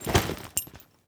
foley soldier gear equipment metal cloth heavy movement light; Gamemaster Audio; Footstep and Foley Sounds